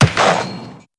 Sidebar Animation Shoot Score Animate; genesis; euro Golden Cup

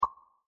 general reveal results; common bonus

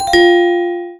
Bet Down; genesis; clash Of Queens

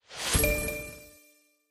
quarter up bonus; Skillzgaming; battle Mania Desktop

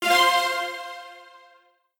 Win; genesis; euro Golden Cup Desktop

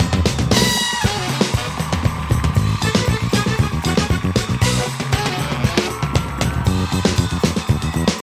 music big win; riviera Star Desktop; Fantasma

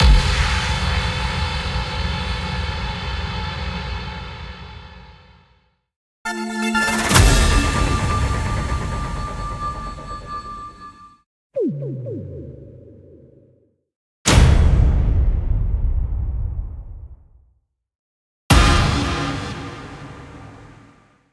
Wheel Spin; Diamond Empire

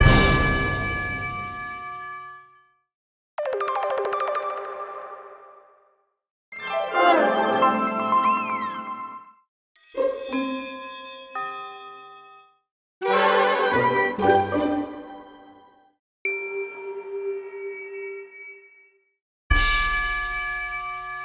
Win Sounds; holly Jolly Penguins Desktop; Mahi Gaming

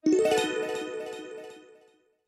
symbolframe lock; riviera Star Desktop; Fantasma

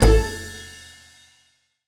big wins pulse; PushGaming; Peter And The Lost Boys; peter Pan

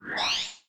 matrix placed; Skillzgaming; gems odyssey desktop

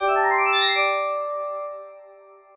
Win; Mirror Magic

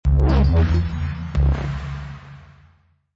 symbol bar; Rabcat; Classic 243

